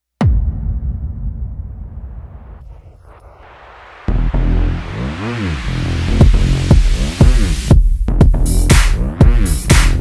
instrumental

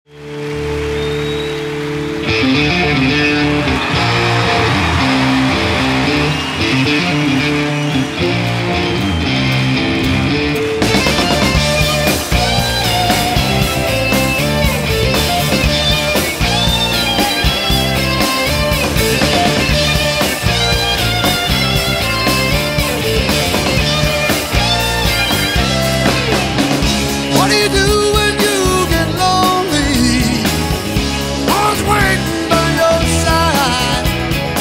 live at royal albert hall orchestral version; live at royal albert hall 1991

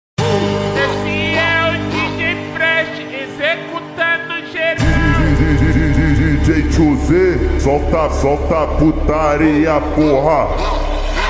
slowed reverb

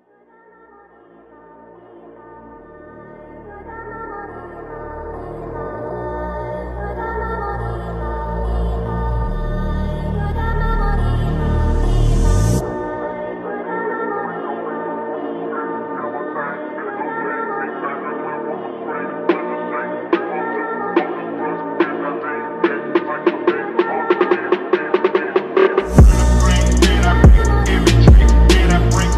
slowed reverb

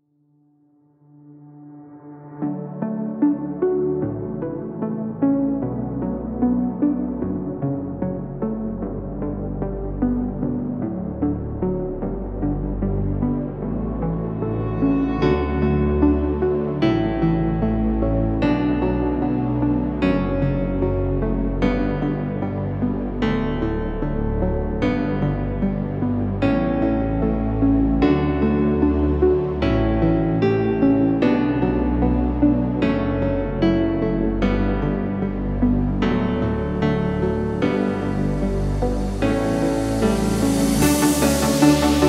canon in d hardstyle bootleg

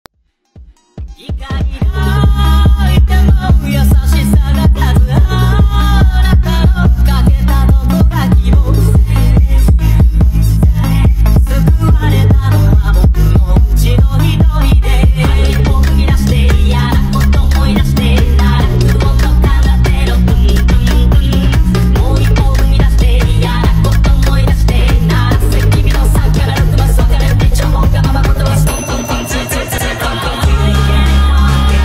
phonk

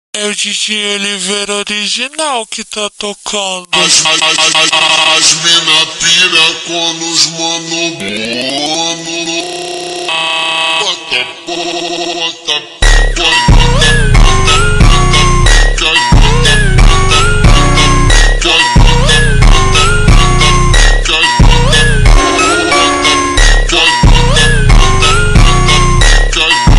slowed

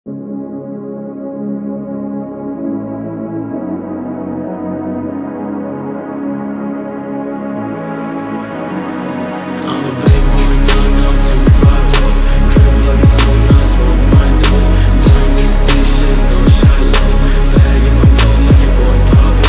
wave phonk

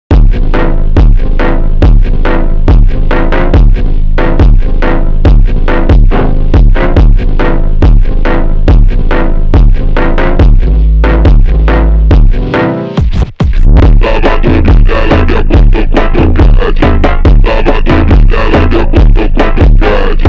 fonk slowed медленный фонк

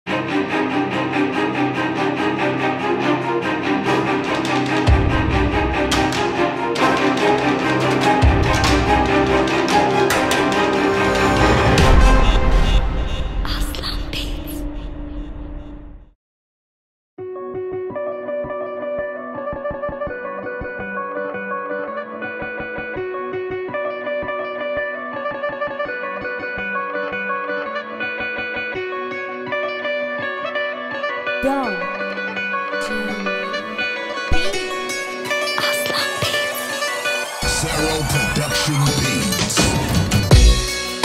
instrumental

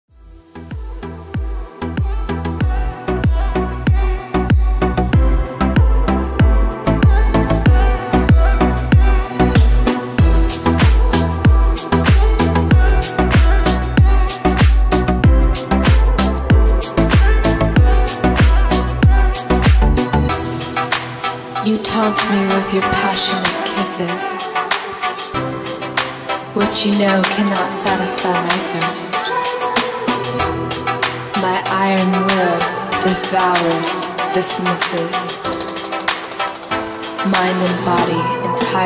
deep sound

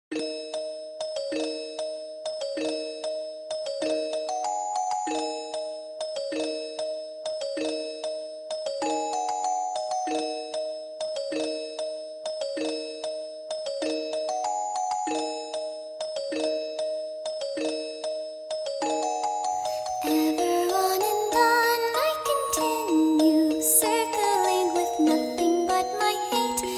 english cover

